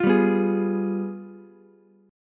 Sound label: G 7th Flat 9th, Ukulele; strummed; JGuitar